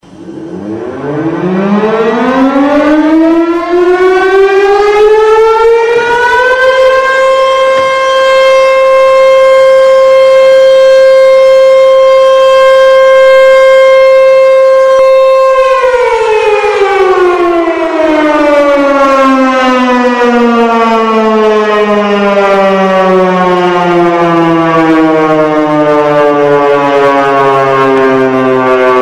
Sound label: Air Raid Siren